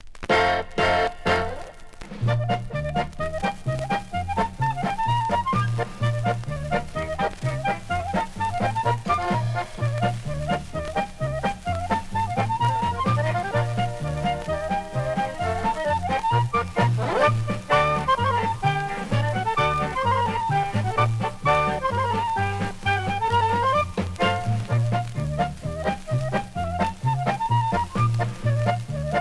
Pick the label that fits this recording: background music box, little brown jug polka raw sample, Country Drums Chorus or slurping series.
little brown jug polka raw sample